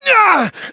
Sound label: HIT; BattleSNDS